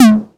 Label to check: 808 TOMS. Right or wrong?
Right